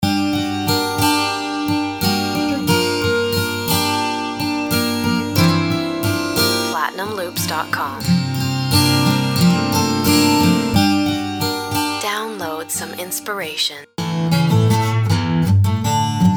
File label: 12 string voice duck